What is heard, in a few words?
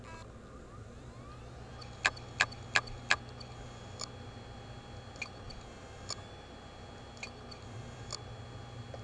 hd sounds, laptop, bad; mac disasters